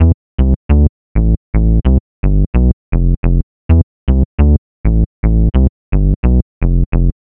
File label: Bass Loop 130 Am; Bass Loops 130bpm; Downtown House